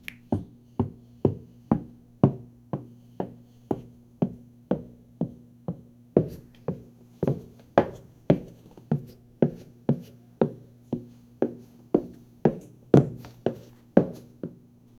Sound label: a soft cherry giggle; childhood